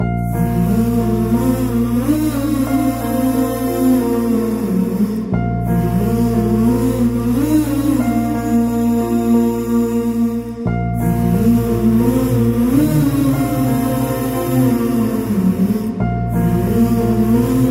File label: Sad Humming